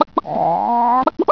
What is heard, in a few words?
chick cluck; chicken; wanted